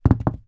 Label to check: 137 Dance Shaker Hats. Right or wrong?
Wrong